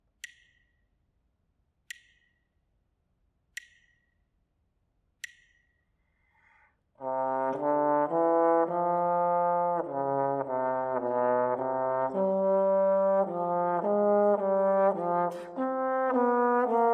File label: melodic dictation; eartraining